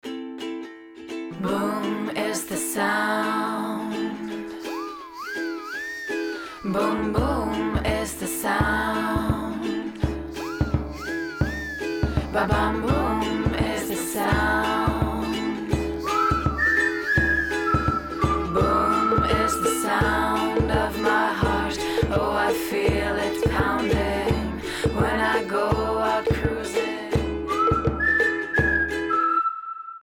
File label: ringtone tones; Mobistar